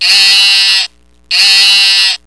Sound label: sheep